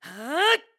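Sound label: Sword Spin, voice; Link; BOTW; Breath of the Wild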